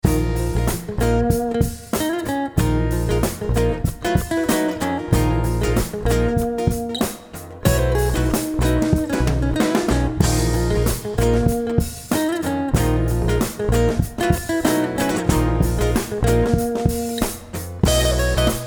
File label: Ext Rock Dro